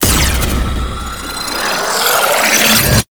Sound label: Doppel Wormhole Mirror Beam Charge; Enemies; Warframe; Entrati Doppelganger